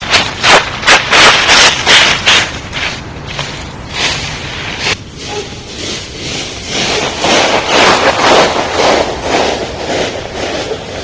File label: leaves